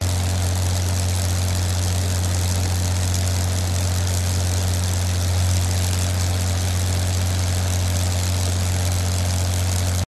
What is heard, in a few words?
ULTRALIGHT PLANE Fly Fast Steady Loop; TRANSPORTATION